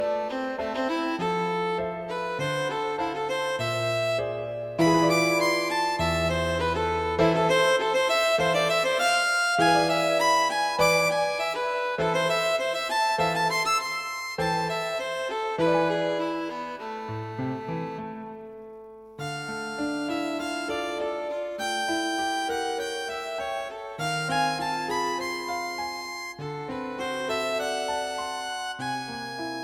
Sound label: Violin and Piano